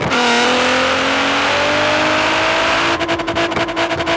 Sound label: third, vehicles; nismor35